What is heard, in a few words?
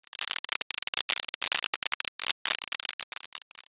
applause